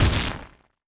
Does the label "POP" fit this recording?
No